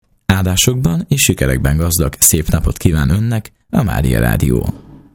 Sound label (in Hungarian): Reggeli Jingle; GYULAFEHERVAR